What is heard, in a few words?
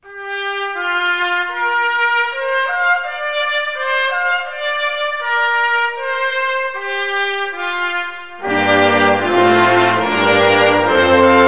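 trumpet orchestra; Musical Instruments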